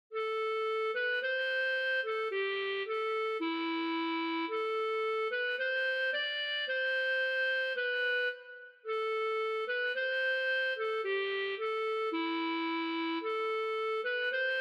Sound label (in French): Saxophone de poche en Ut